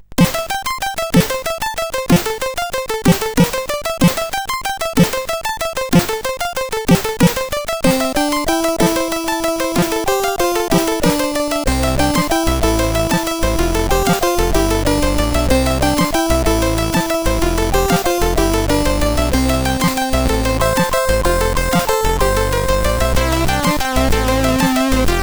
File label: Slow Rock, CSG8580R5